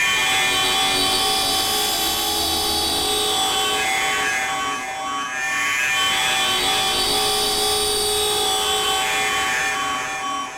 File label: Korg Electribe